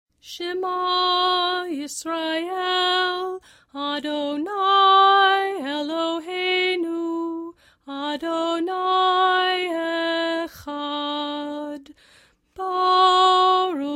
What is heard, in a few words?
Sh'ma Chanted; Cantor; bnai mitzvah study guide friday evening